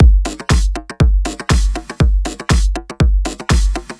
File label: multi delayed